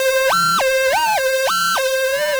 Roland D Line, Roland S100; musicradar-synth-samples

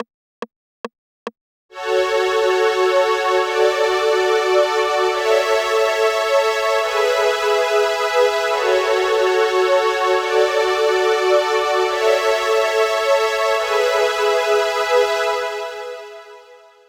String Chorus (open), 142 BPM; Vengeance Samples; Hello You

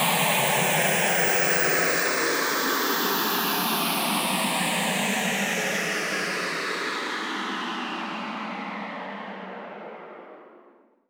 FX Sweep Down; Vengeance Samples

